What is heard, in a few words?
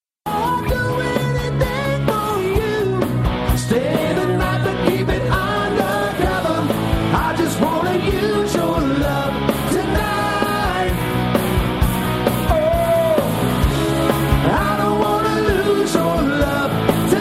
80s Rock